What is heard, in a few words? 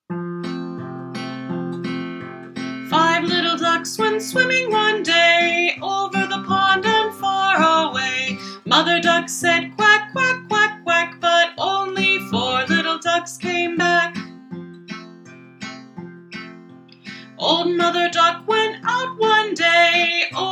Five Little Ducks Went Swimming